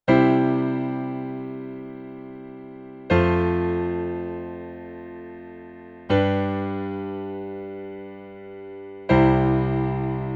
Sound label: piano streched tuning tempered